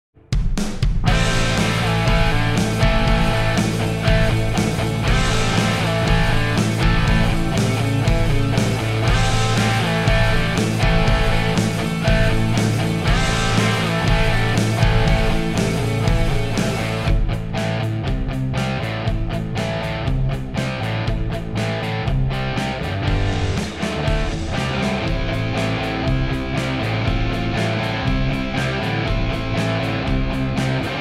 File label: 80s Rock